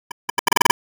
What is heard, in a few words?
Tech, Weird Side Samples